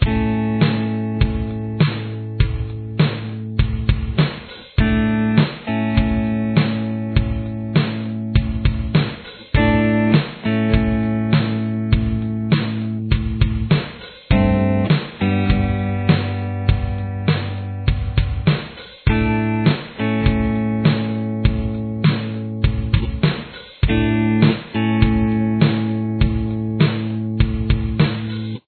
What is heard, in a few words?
solo guitar